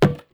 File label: thick plastic hard